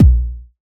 verby steel kick drum sound a sharp key, Royality free kick tuned to the A# note